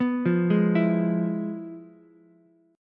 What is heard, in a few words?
F Minor Major 7th Flat 5th Ukulele; FmM7b5 arpeggiated; JGuitar